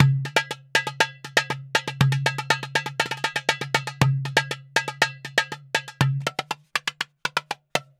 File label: Beat; pooh; merry games; jammin